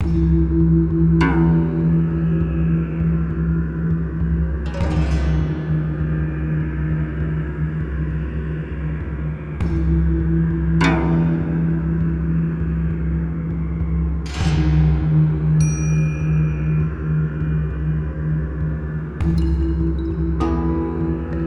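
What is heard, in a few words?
japanese horror; covered in fog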